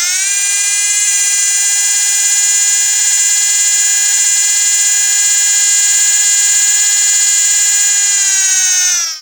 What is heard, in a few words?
teleporter build open; tf2